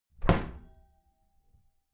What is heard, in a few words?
wooden door close heavy glass clang